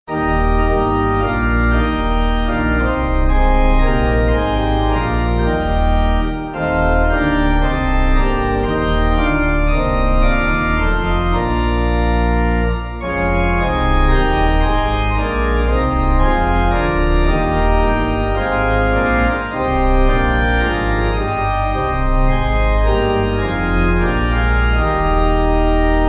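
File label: Pipe organ, church music backing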